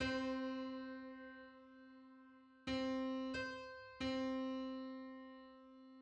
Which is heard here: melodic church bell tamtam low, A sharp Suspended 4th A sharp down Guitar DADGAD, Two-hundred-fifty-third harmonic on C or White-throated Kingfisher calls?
Two-hundred-fifty-third harmonic on C